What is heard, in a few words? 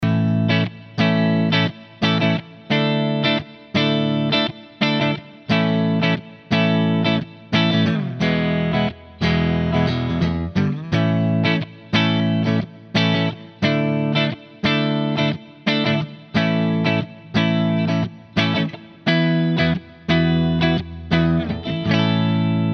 Epiphone Custom Les Paul Neck clean